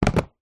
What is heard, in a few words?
foley card box small product set down table